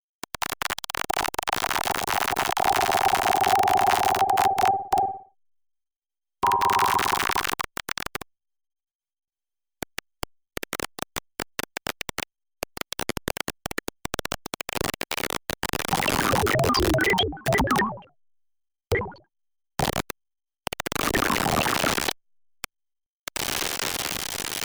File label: granular moog